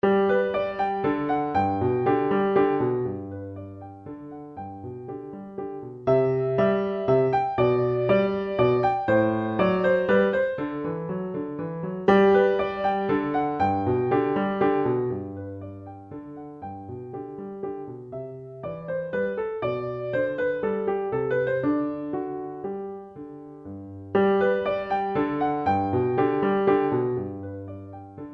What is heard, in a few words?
Bach Menuet Sol Major